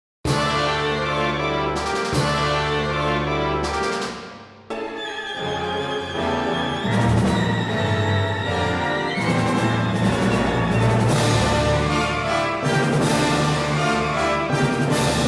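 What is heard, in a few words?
orchestra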